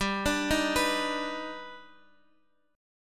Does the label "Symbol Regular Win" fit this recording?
No